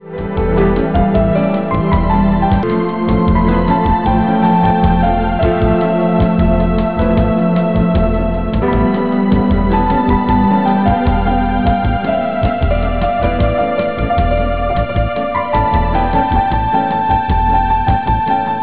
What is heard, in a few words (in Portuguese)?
teclado